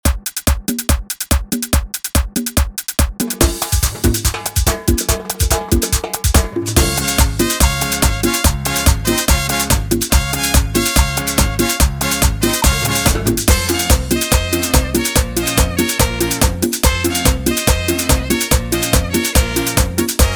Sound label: intro outro 143bpm